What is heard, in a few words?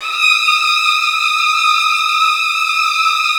D#3, Strings, STR HLF TRLL; ILIO; Kirk Hunter Virtuoso Strings VOL-3; 90 s Sample CDs